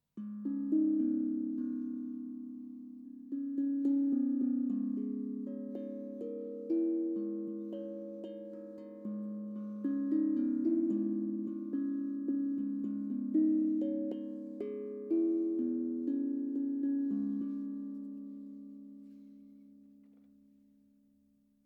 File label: Tong Drum cis